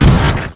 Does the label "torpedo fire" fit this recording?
Yes